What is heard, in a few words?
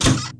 Lift